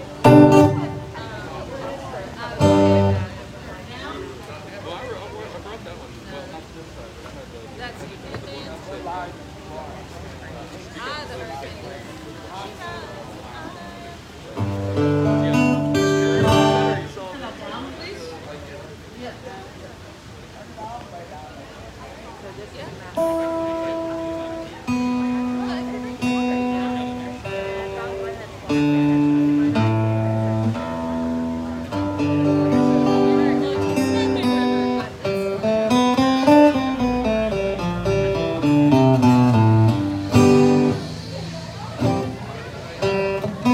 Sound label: soundcheck